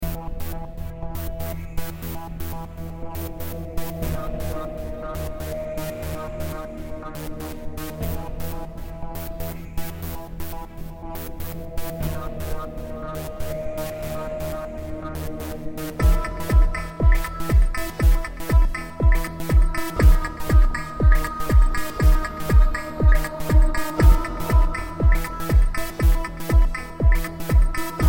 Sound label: suspense Background